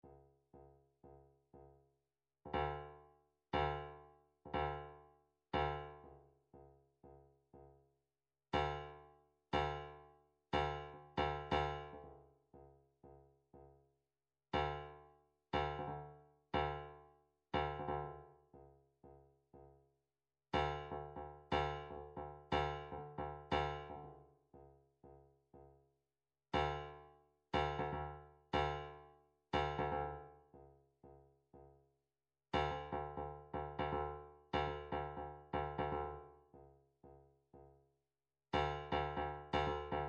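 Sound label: drumtrax toolbox snare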